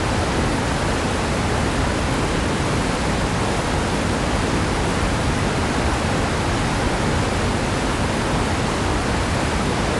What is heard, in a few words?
Waterfall; magic era